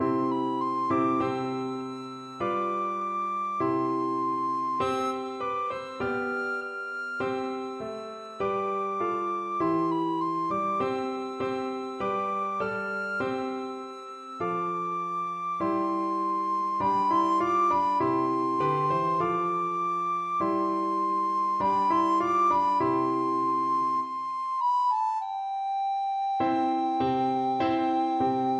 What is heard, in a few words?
recorder